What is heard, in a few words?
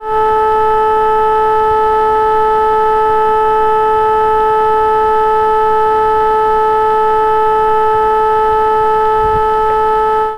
LCD RFI 250kHz